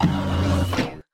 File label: Hi-tech Panel Servo Button